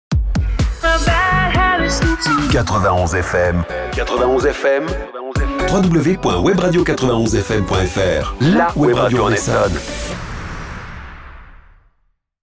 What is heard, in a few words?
Sweep ID; 91 FM; Essonne